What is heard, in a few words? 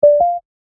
ping